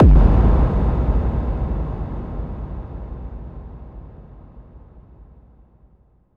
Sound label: Bassdrums FX, FX Kicks; Vengeance Samples